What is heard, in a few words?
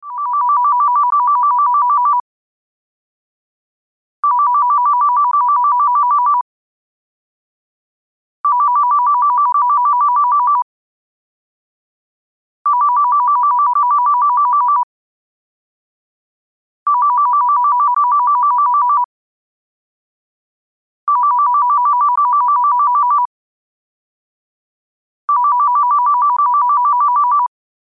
landline phone ring ringtone